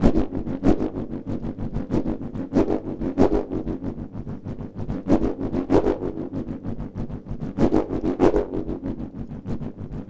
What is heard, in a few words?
Wind Wand Pulse, Ethnic Percussion; Sonic Foundry (Sony Creative Software); Ambient Atmospheres & Rhythms